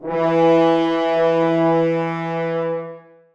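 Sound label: horns sustain e3; Sonatina Symphonic Orchestra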